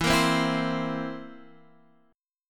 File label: A sharp Suspended 2nd Flat 5th E